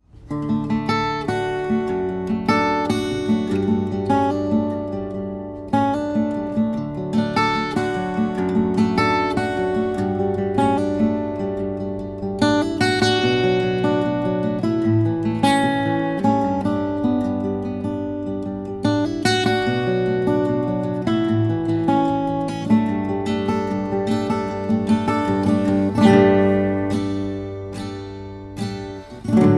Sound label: Lowden S35c, guitar; Winter 2018 Ltd